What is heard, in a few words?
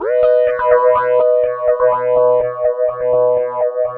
Analog Rhythm